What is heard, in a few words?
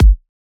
kick; ENZO Drum Signature Bundle Vol.1